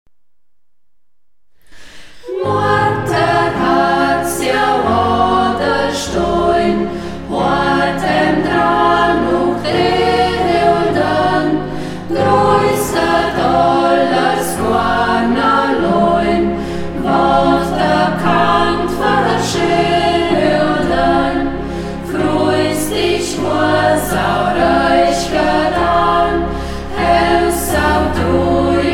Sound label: chor, Ortsmundart; Georg Meyndt (Text), Georg Meyndt (Melodie); Motterhärz, ta Äddelstien (Det Motterhärz); Burgberger Chor; Hegt wird gesangen